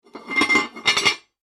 household slow cooker lid on; Ceramic Slow Cooker Lid Placed On Cooker; Kitchen; McKinney Sound

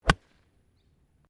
GOLF SWING IRON; Various Golf sounds; Sports

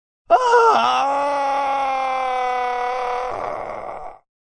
Man In Pain, A man in pain

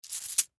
Coin Purse Set Down; Money Sound Effects; Foley; Objects; Blastwave

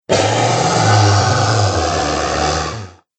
Tired Cave Dragon, monster; Monster Sound Effects; horror sound effects

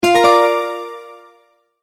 multimedia tone start; Sound Design; Multimedia Tone-Start 23; McKinney Sound

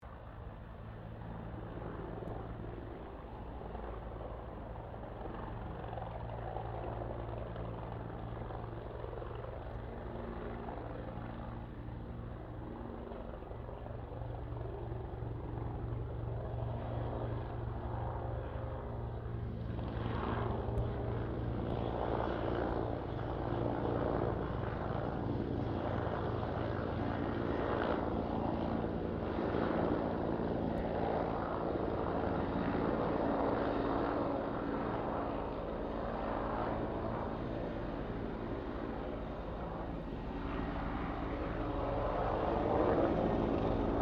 helicopter Bell 204 firefighter pass medium; Vehicles; Aircraft; Helicopter Sound Effects; Helicopters (Sound Effects:Vehicles)

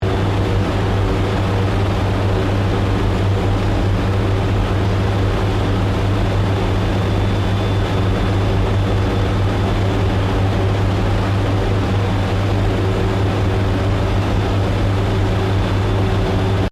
MACHINERY AIRCONDITIONER VENT ACTIVE; Industrial machines; Sound Effects:Machines

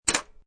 CD Player Open, CD player opens; Sound Effects:Electronics

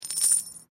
Coin Penny Multiple Drop Stone; Common coins; Money; Objects

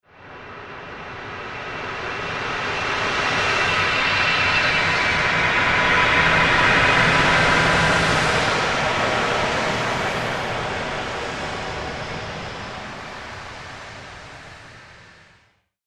Waves Of Dark Magic, magic; Magic Sound Effects

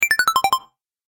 Game App Reveal, Video Game Sound Effects

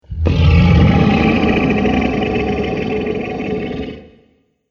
Dragon Mighty Growl, monster; horror

